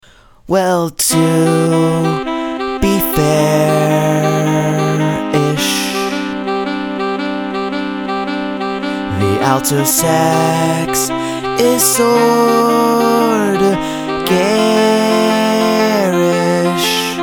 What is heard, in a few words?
alto suxaphone